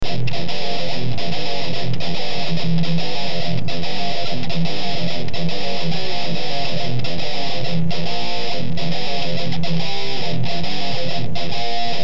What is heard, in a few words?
riff